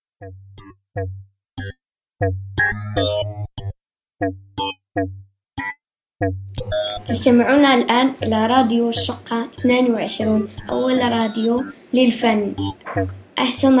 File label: Jingle